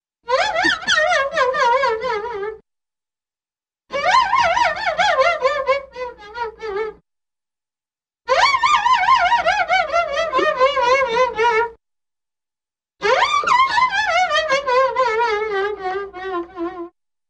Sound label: Cello Laughs; Hollywood Edge Cartoon Trax